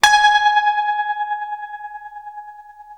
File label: TREM G#4, Guitar, TREMELO; East West; Steve Stevens Guitar Samples Collection